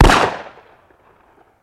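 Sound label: 357 Magnum Single Shot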